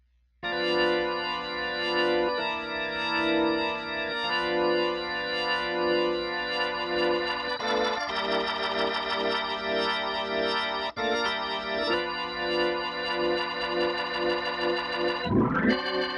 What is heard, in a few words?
Organ EQ; Daking